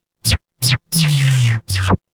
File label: fire electric zap